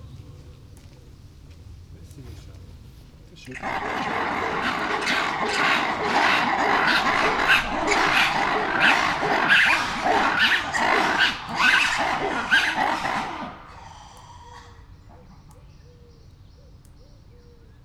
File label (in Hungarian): perpatvar, vörös vári; allathangok; veszpremizoo 2013